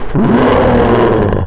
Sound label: Demon Scream; Ultra Vixen 2